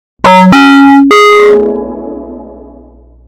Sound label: bass boost startup sound; windows 11; minus ushi